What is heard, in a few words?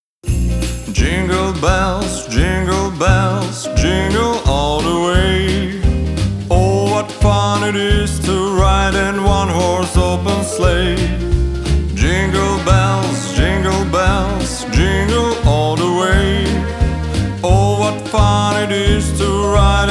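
Male vocal Jingle Bells cover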